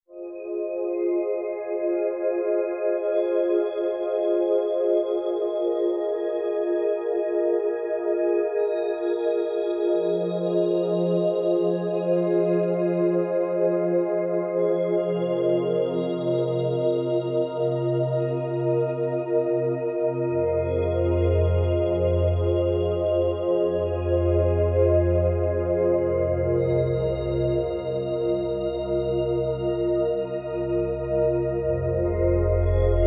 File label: Ambient Sounds